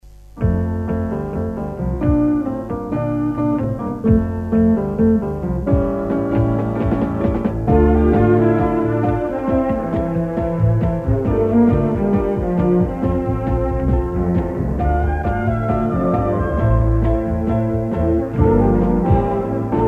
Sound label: signature tune; Meridian